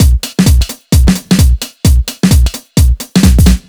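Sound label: No Percussion, Drum Loops 130bpm; Zero-G; Soundclash Nu Dance Electro; Acidized Wavs; Riddim Clash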